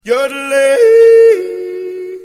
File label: Cartoon Sounds